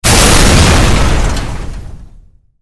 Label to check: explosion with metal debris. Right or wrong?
Right